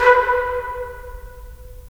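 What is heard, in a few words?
brass trumpets staccato, right; Roland LA Composer vol. 4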